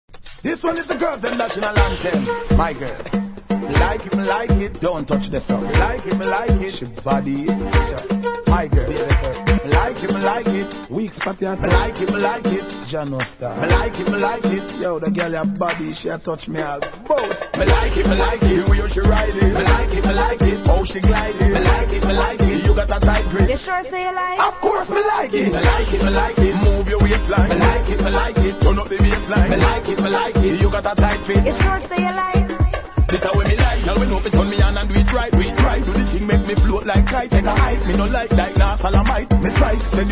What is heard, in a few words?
flamenco riddim